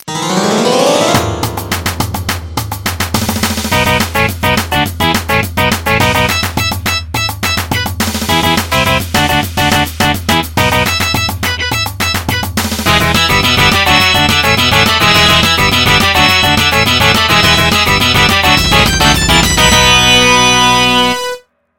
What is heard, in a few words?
Theme Song; Clarence